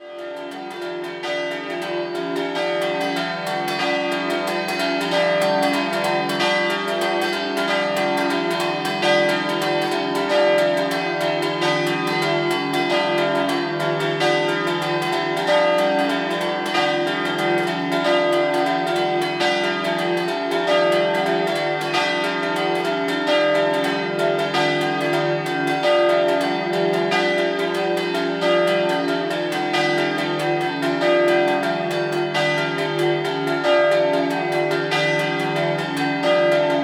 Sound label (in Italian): Villanova Scampanottata